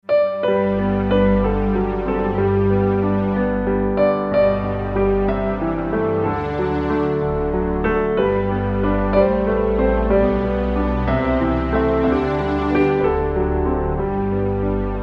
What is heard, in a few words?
Classical Interlude